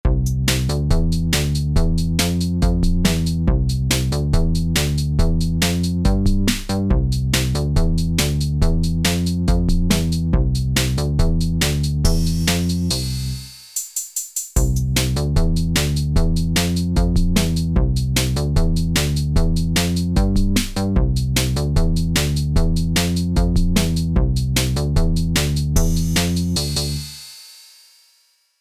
bingo bass background music with haste

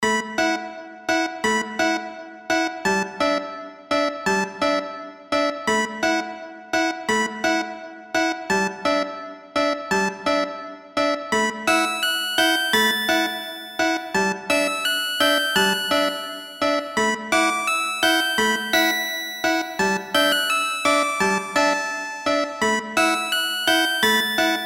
walk in the twilight forest music

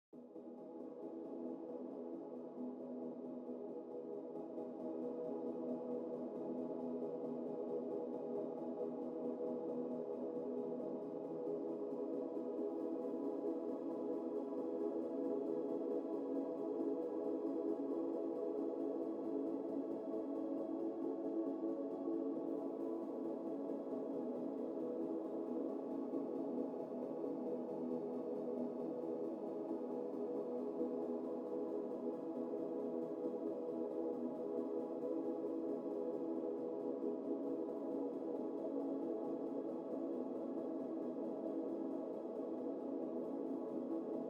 airy, dark sci-fi audio; dark sci-fi audio pack

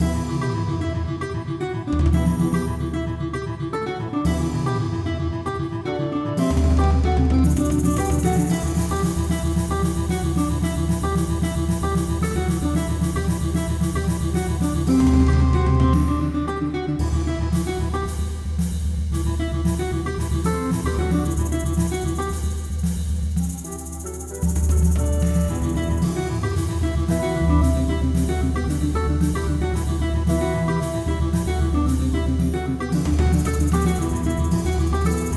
boss battle medieval remake

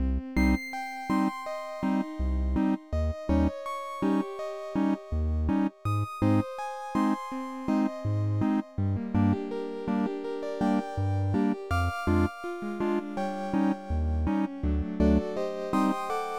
Omnichord; 82 BPM

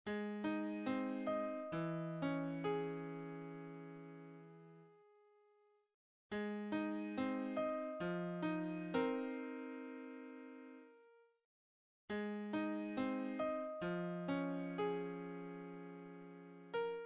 piano

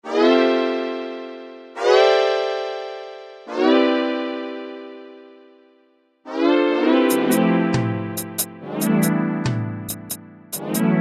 chilly beat, chill music

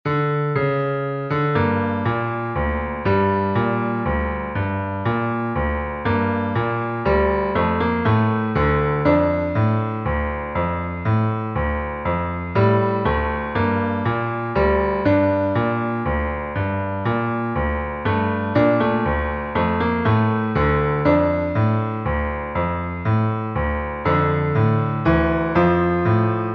Funeral song

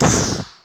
Explosion

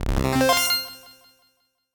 power up sound

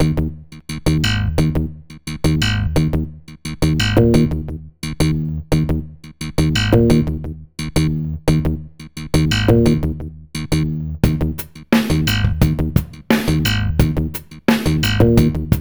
menu loop

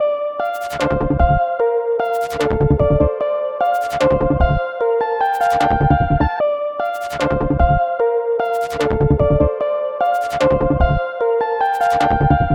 Space Music

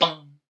bung; boings

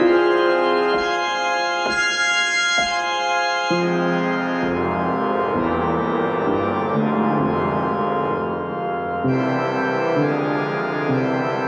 organ and piano; 2019 Eclectic Music Pack

